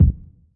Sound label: rich low end bass drum single shot g sharp key